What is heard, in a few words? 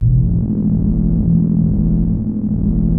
JUPITER 8 E2; Hollywood Edge - Giorgio Moroder Rare Synthesizer Collection